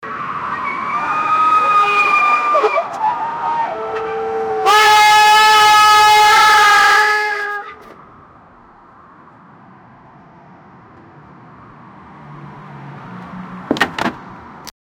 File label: car crash and screaming; distress